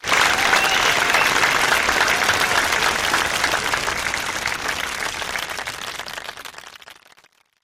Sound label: applause audience medium